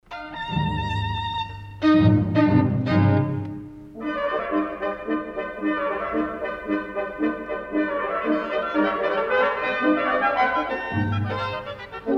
Concerto pour violon et orchestre Toccata; STRAVINSKY

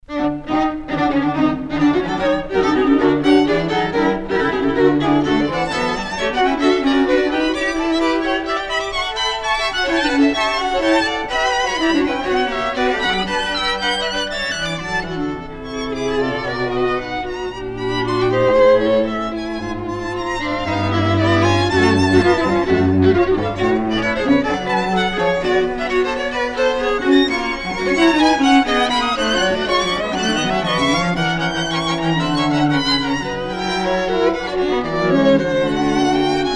Quatuor à cordes en ré majeur Allegro; ROUSSEL; op. 45

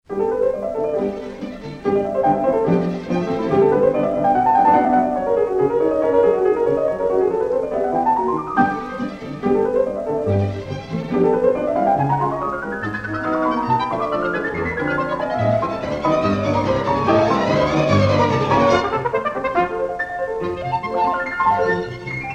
CONCERTINO POUR PIANO ET ORCHESTRE Presto leggiero; FRANCAIX

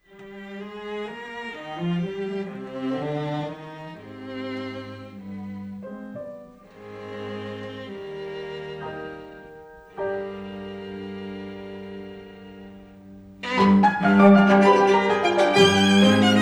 Quatuor pour piano et cordes en ut mineur Allegro; Strauss Richard; op. 13

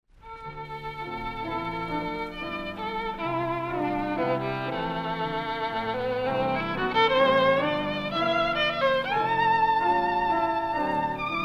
SONATE POUR VIOLON ET PIANO; With easy movement but no quick; Slow; DELIUS

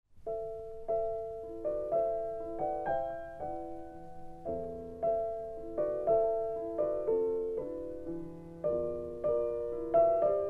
Trio pour piano violon et violoncelle en la mineur Modéré; RAVEL

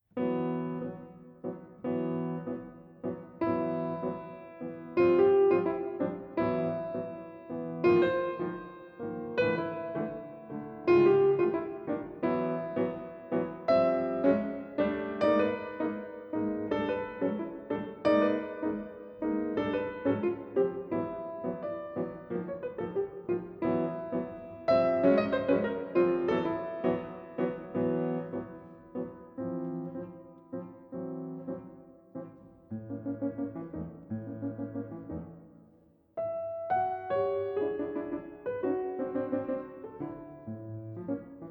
Esquisses de jazz Tango

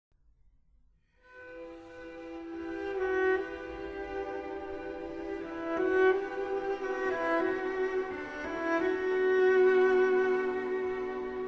les violoncelles

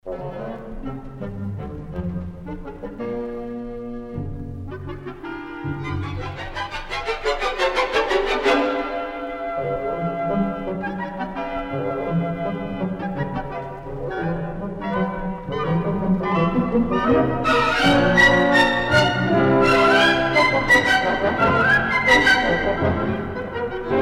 Concerto pour violoncelle et orchestre; Allegretto giocoso; RIDKI; op. 36

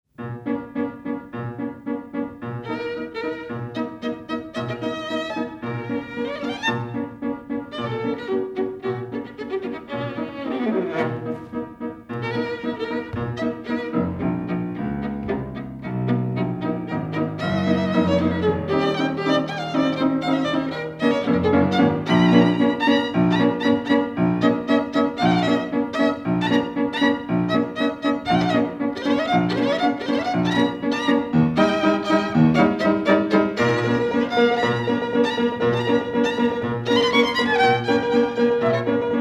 Sonate pour alto et piano en ré mineur Allegretto; CHOSTAKOVITCH; op. 147

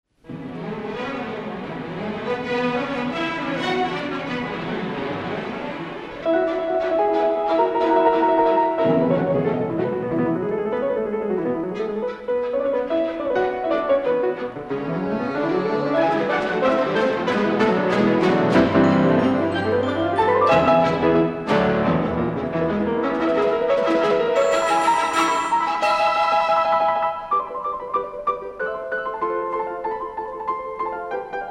Concerto pour piano et orchestre Presto; CONSTANTINESCU

